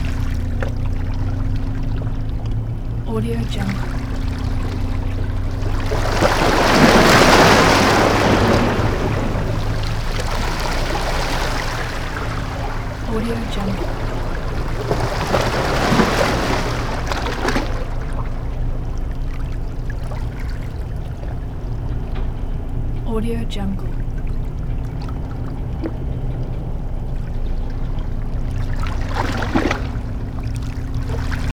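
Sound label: water lap close up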